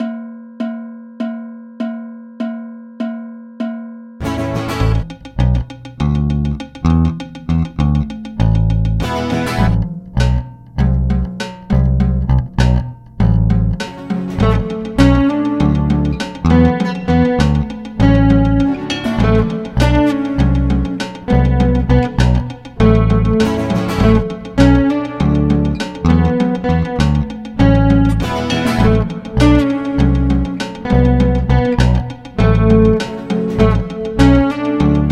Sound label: cowbell jam; weeklybeats 2024